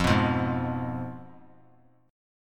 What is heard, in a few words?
F Diminished; Fdim strummed; JGuitar